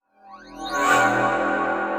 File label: static; radio susan